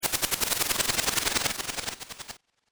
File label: bird